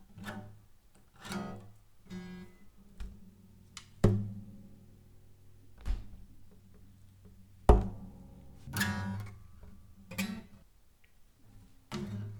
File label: Putting Guitar Aside